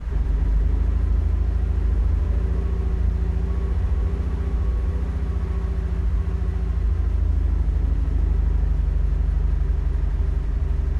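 Cromwell Onboard Fast Drive Stop Off Interior, tank; Cromwell 1943; MKH8020; Pole Position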